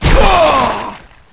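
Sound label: zombie trapped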